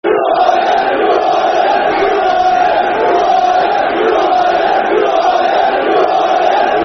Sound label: Who Are Ya Football Chant Soccer Songs; Crewe Alexandra Cafc